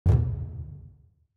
spin btn; netent

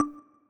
paytable click; netent

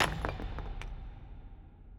button stop; netent

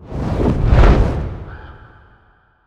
big Win Raven Fly Away; netent

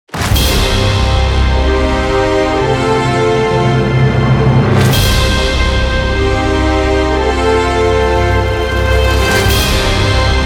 big Win Loop

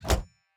reel bounce; spinsane; netent

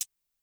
button Out; netent

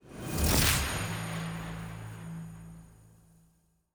small win; netent

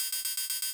Health Meter Lock Blink

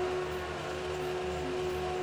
reel respin

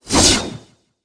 win sweep Vertical; netent

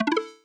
button Spin; netent

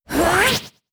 Pink Charge; netent

